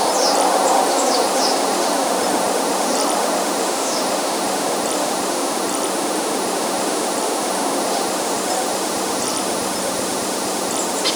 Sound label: peaceful ambient soundsc, bird calls